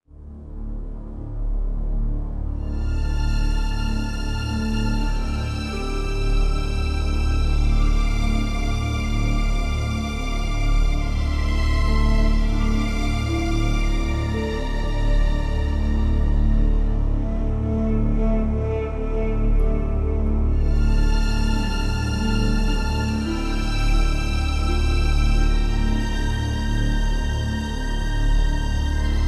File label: Background Music; Platoon